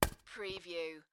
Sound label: Squeezy Honey Bottle; beanstalk audio